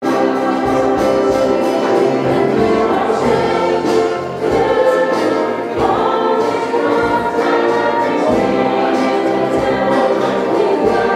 band in rehearsal church; st peter s farnborough

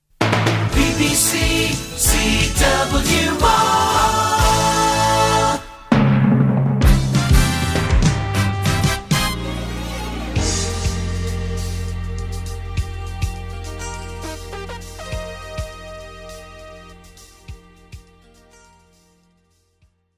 news jingle

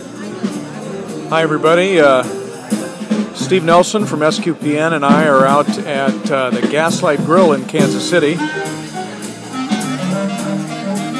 new orleans jazz; sqpn style

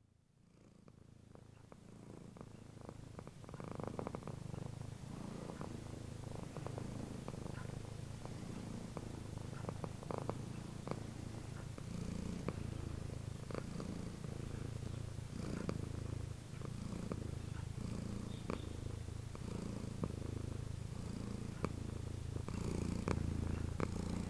purring

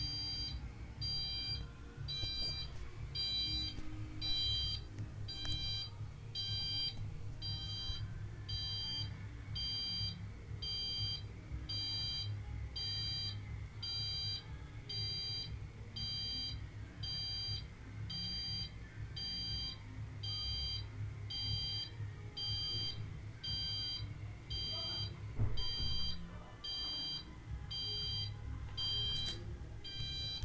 annoying sounds

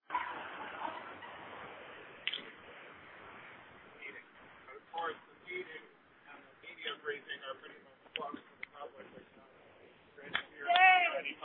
chanting at the protest; smithsonian

